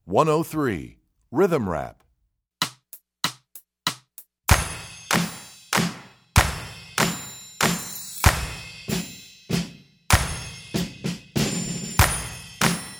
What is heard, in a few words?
Rhythm Rap, Strings; EE2000 Book1